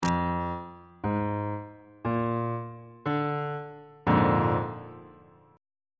a sharp dominant 7th 2nd inversion

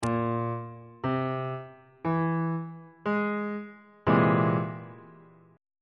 c augmented 7th 3rd inversion on bass clef

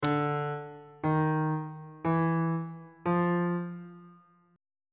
b sharp 3rd interval pitches on bass clef

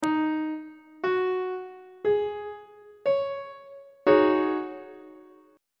g sharp dominant 7th suspended 4th 2nd inversion; on treble clef

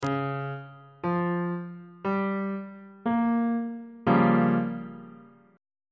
b flat minor 6th 1st inversion on bass clef

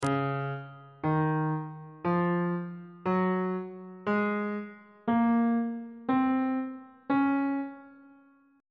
c sharp major scale note positions on bass clef

